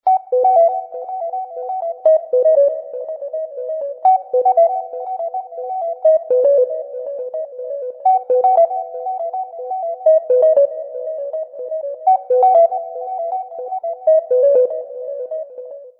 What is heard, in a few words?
xiaomi redmi note 13 4g